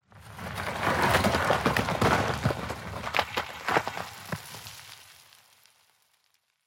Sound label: tenement rockfall; ambient, rocks